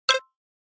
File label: undock